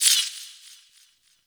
Interface Hover; Warframe; Tau; Somatic Bearer

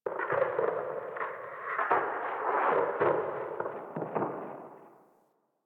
Landscape Glacier Ice Distant Medium, Ambience; One Offs; Warframe; Venus Open World

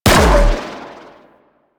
Briefcase Akimbo Pistol Shot; Warframe; Weapons; Corpus

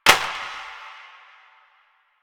Plastic Drop; Warframe; NineteenNinetyNine

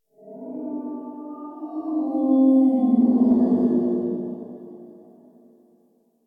Exterior Distant Calls Angry, Ambience; Warframe; Duviri

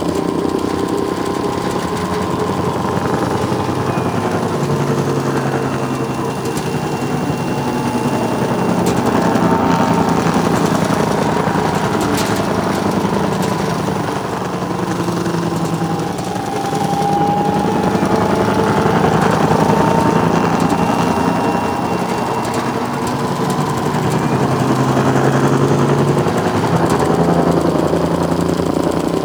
Skiff Engine Loop Slow, Vehicles; Grineer; Player Vehicle; Warframe

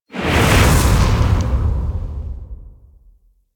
Sword Large Whoosh; Warframe; Cephalon Weave; Weave Boss

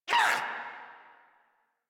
Pain; Grineer Jester

